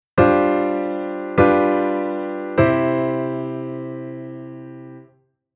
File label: Chord Vsus4-V7-I